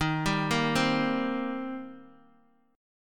Eb 6th Eb, Guitar Open D; x,6,5,4,3,x; down

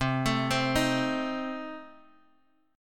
C Minor 6th Guitar Open G, chord

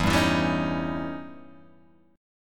E Minor Major 13th E Guitar Open G

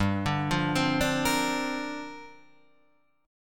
C 7th Suspended 2nd Suspended 4th, G

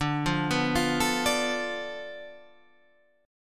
D Minor 6th

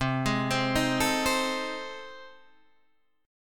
A Minor Major 7th Flat 5th C

